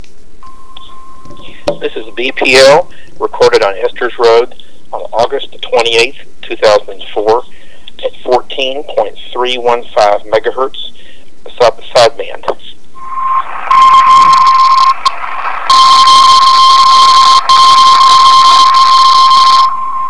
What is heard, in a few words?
BPL 14.315 MHz SSB; Irving TX